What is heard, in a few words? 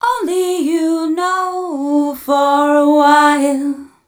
f 132 mel, Vocals, fem